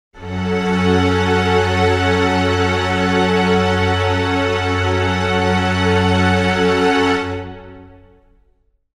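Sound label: string chord f sharp minor, Pads; flaechen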